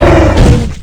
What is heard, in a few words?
thumper idle; lethalcompany; zerosiki; slender